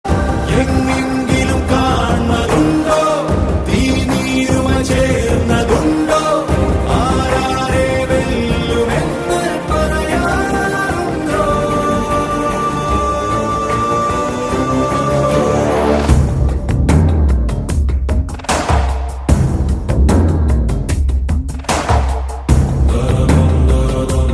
malayalam; rrr

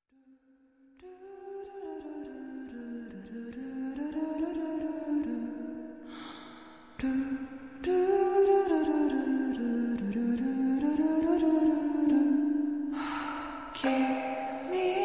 slowed and reverb ringtone english tones

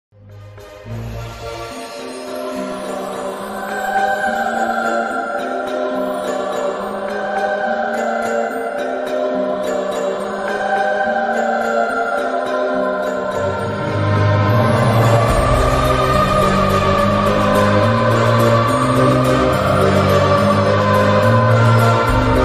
love story instrumental

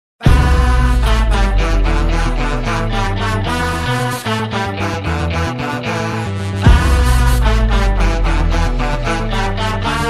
bgm ringtone tamil bgm ringtone; Aavesham

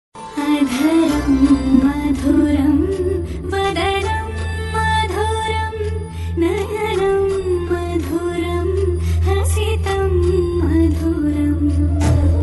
adharam madhuram ringtone bgm

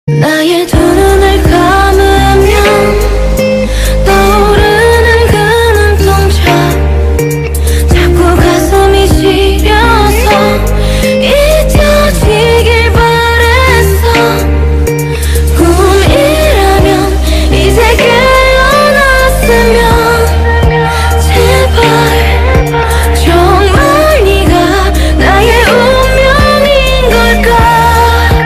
korean song ringtone bgm

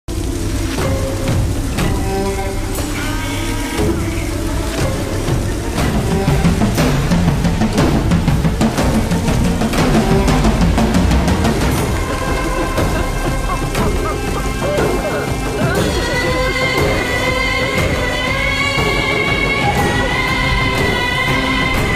interval bgm; masooda movie

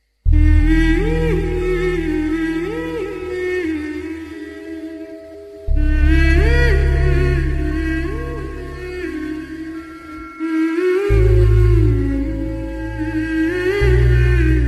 love bgm tamil love bgm telugu love bgm; awara movie

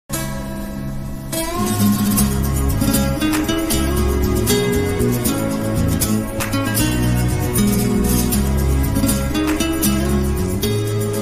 guitar bgm; Khaleja

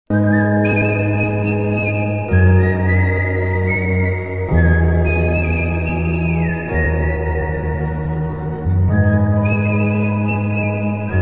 whistle ringtone, bgm; animal

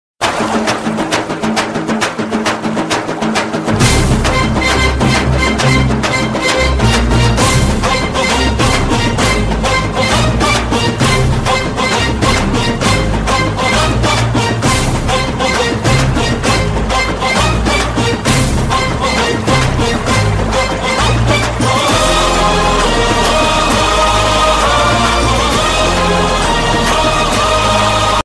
mass bgm; vishal

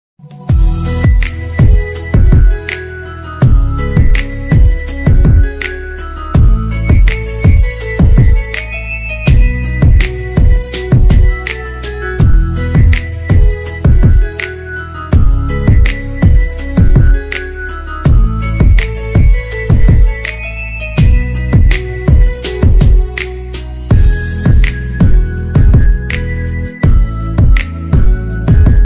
instrumental ringtone; ms dhoni; sushant singh rajput

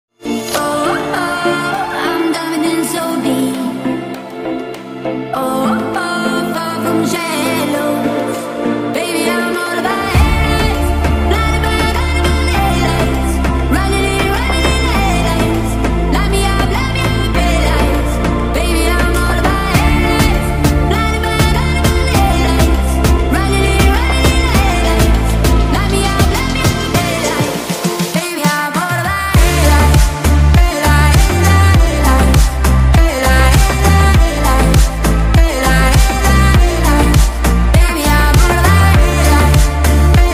song ringtone attitude ringtone

